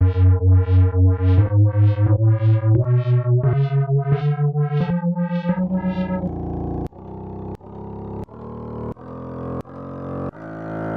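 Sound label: F sharp Harmonic Minor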